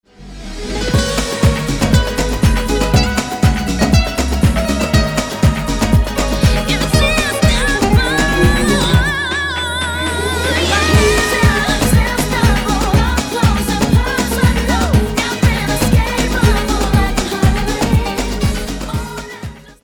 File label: club mix, guitarist